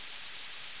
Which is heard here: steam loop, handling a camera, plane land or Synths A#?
steam loop